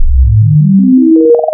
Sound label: SYNC, wavetables, Classic Synths